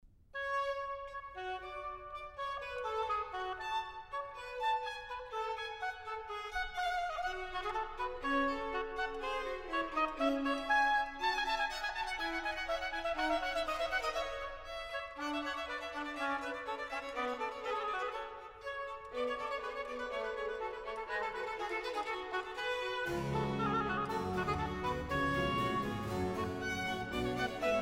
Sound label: Oboe Sonata in G minor, Allegro; George Frideric Handel; Esther 1718 version; Purim; HWV 404; II Allegro